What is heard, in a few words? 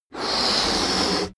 balloon blow